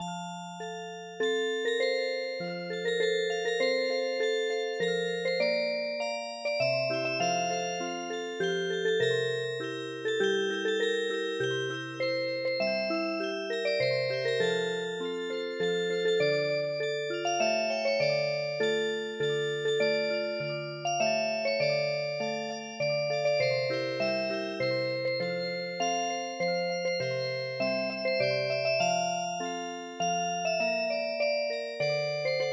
Music Box; midi; traditional

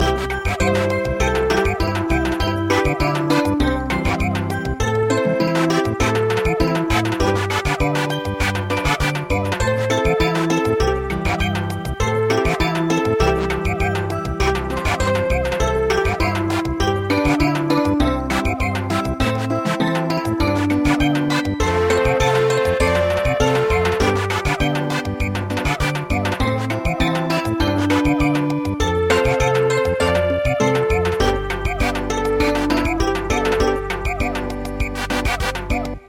Samba; The Melody of Purple Bamboo

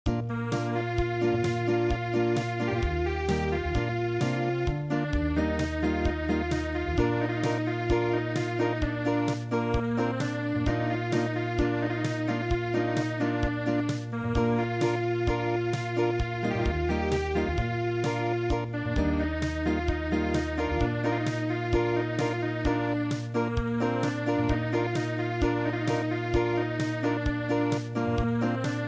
Unplugged

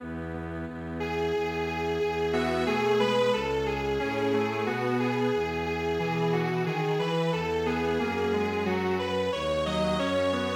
Strings Quartet

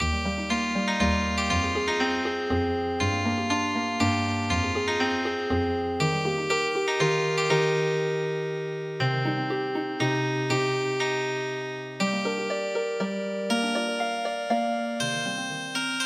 Healing Harp; gayaeum ta ryeong

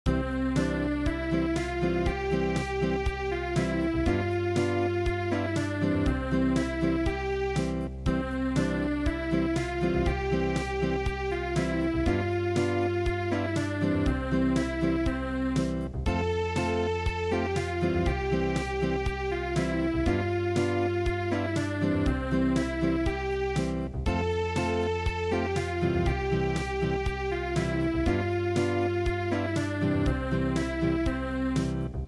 Unplugged

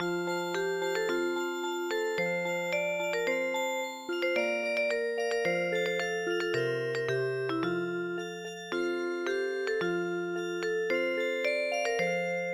sampung mga daliri Music Box

